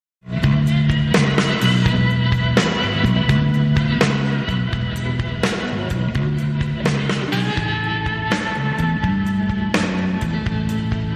Strung Out Blues

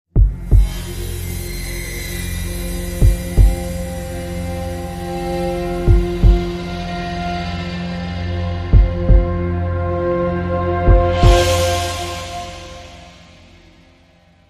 Crime Sting, No Piano

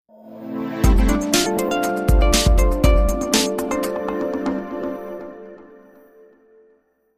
Sonic Funk

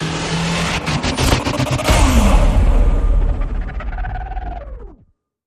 Hit; Sttr Stranger

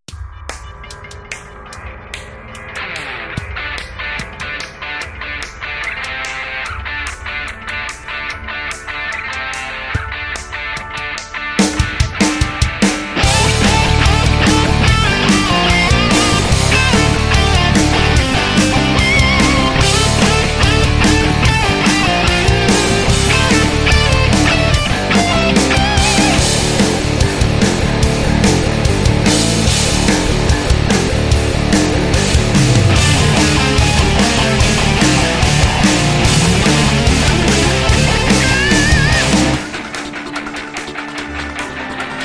Energetic Frenetic

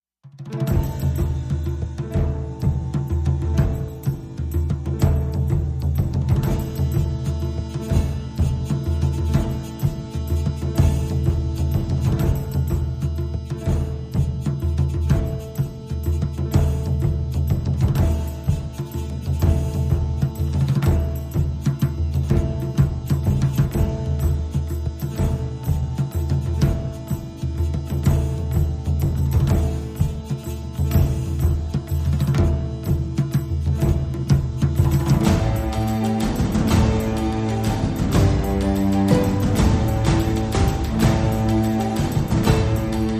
No Melody

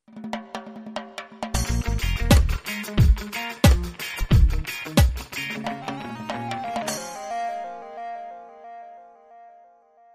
Island Skank

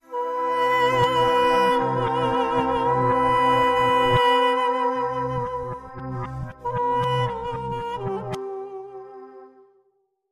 Link Sting

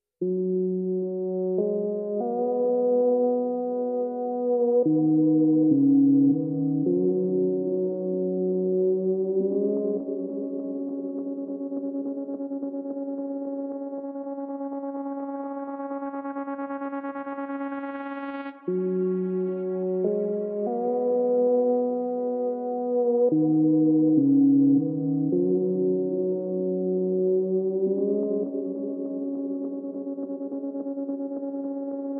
Synth and Strings Mix

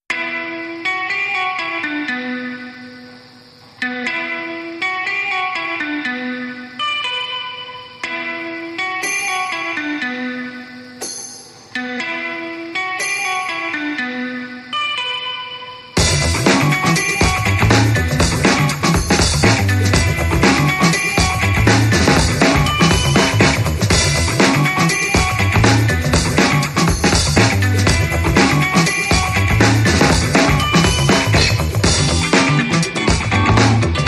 No Glockenspiel Mix

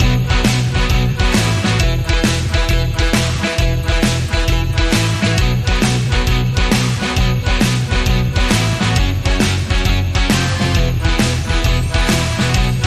Rock n Roll Christmas, No Melody

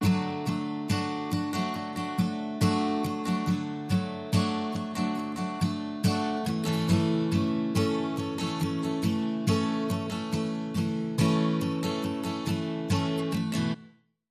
Calm Strolling, No Drums

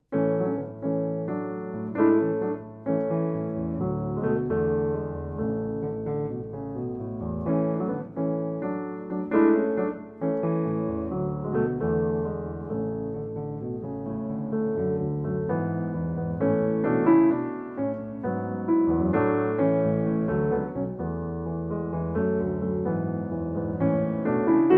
Solo Piano

